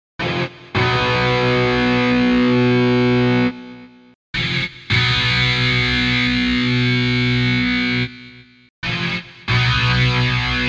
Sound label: Power Chords; Magix Acid Pro 10